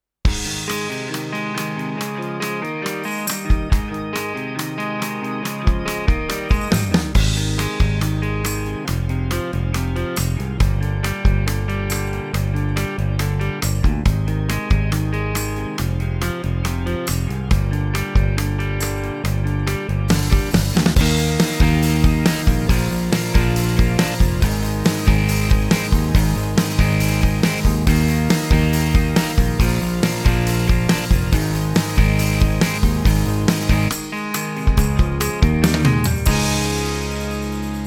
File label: Yamaha S970 Style